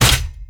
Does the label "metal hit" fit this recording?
Yes